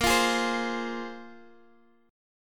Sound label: A sharp 7th Suspended 2nd, Guitar Half Step Down chord